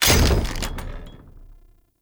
Chop Entrance Impact; Sinecure Chop Entrance

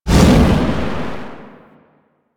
Void Dragon Firebreath Stop; Enemies; Abilities; Warframe; Duviri

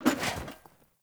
Foley Action Armour Roll; Characters; Player; Deora Armour

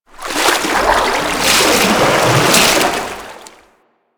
Ocean Water Rising Laps; Ambience; Warframe; Grineer Ocean

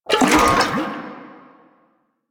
Alchemy Grenade Aim; Warframe; Entrati

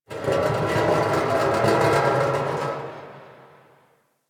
Galleon Metal Scrape Amb; Ambience; Warframe; Grineer Galleon

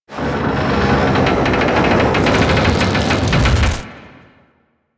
Death Fall; Enemies; Abilities; Teralyst

